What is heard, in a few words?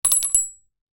cart